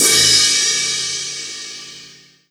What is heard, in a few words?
Crash Long Acoustic; ColomboAcoustic; pitchglitch